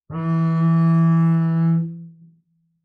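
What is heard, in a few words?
Bass arco fortissimo sul A E2, Double Bass; MIS Pitches - 2014; Strings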